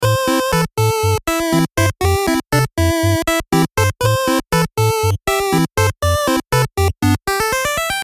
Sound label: Short 8Bit